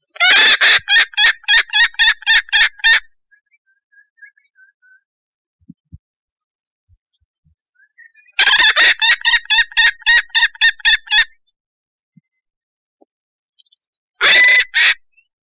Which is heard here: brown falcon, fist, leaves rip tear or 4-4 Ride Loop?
brown falcon